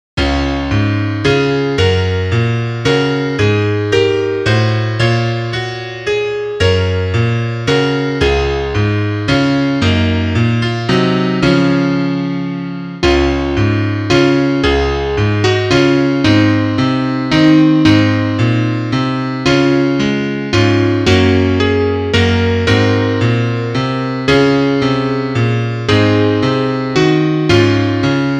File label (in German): Klavier; sah ein knab